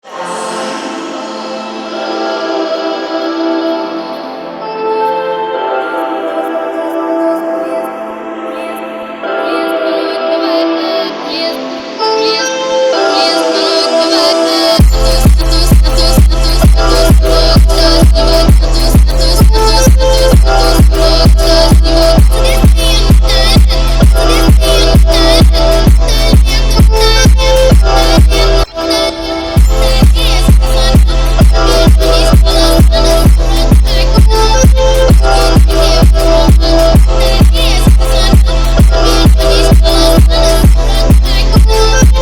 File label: phonk